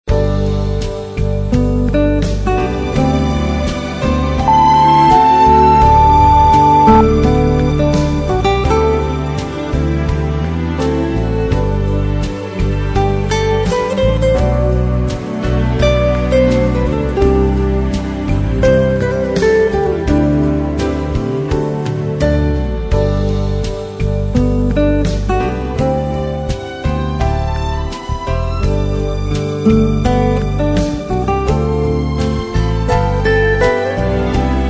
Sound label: guitar; Beauty the Beast